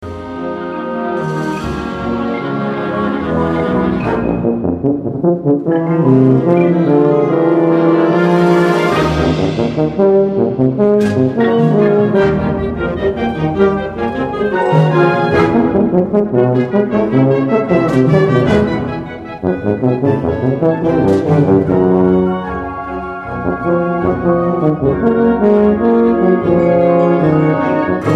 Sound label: Tuba